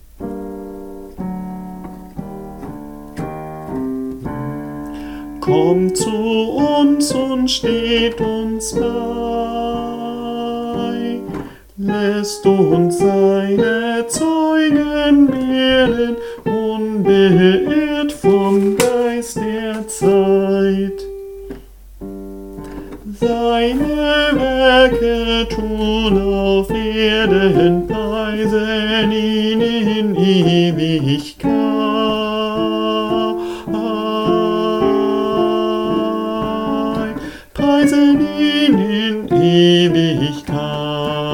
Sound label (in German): Sopran; Jauchzet